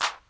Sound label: clap